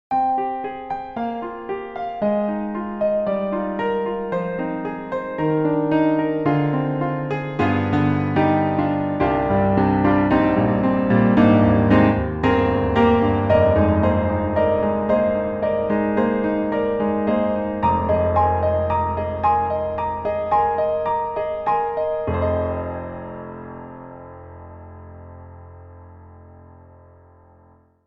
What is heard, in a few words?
Roland Juno-Gi 88 Key Grand